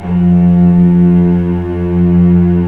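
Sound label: strings, mf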